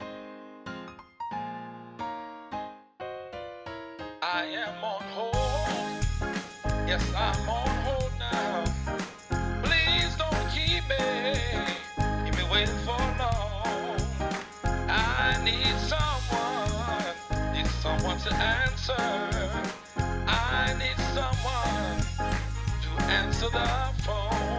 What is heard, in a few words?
Jingle